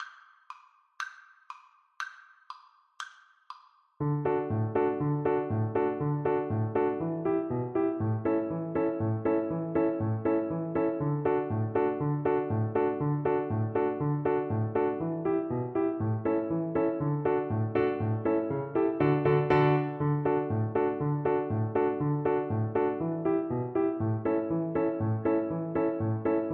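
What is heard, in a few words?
VLC karaoke, Cello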